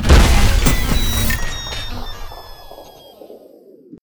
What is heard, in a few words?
Nitro Overheat